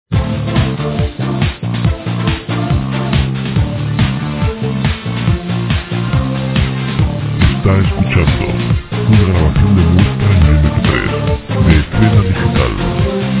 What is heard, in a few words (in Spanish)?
sintonias